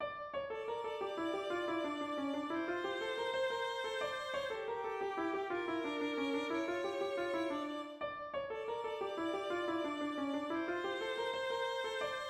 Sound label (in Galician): muineira; de Cabana